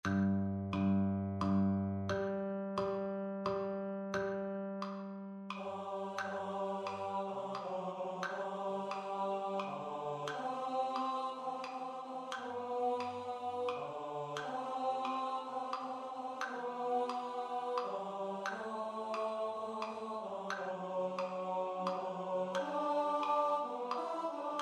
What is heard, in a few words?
Relieving Suffering TENOR STUDY